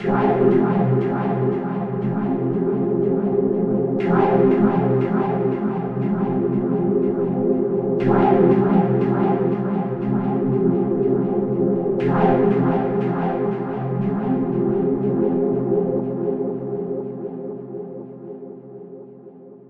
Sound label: pad short vocal pad